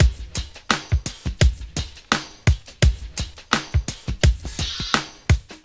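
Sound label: 85 Drum Loop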